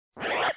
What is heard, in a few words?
square